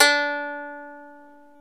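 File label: ethnic plucks; Eth Plucks, Pluck Duo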